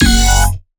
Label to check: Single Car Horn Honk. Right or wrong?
Wrong